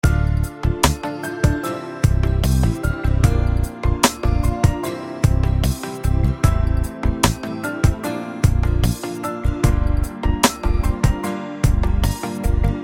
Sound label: Sixteen Bars Demo Arrangement, real guitar